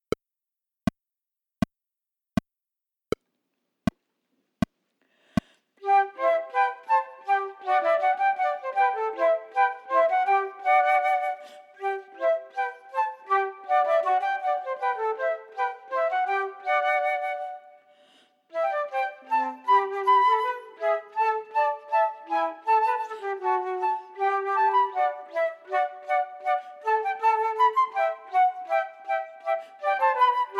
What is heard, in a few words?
Bourée, Chamber Music for 3 Flutes, minus 1st part; Partita in F